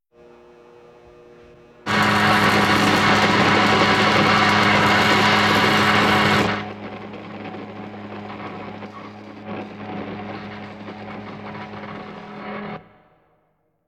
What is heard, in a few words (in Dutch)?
Elektrische Stoel, horror effects; KMP Film Video Sound Effects